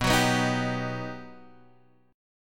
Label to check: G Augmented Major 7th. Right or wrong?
Right